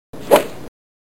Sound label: Golf